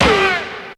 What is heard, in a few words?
BANG; JUST FOR FX